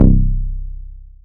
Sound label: bass